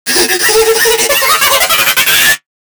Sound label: Click Tick Game Over; CircuitBreaker